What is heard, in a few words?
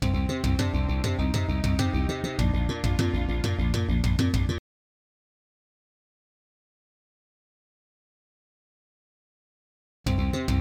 diamond db 4mb; Soundcard test page; Hocus Pocus